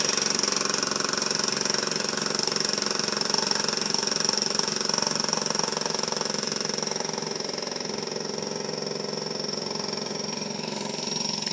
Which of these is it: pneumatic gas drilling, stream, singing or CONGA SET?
pneumatic gas drilling